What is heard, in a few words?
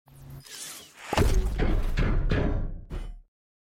Box open; Astroneer